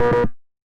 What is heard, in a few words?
Dialogue Start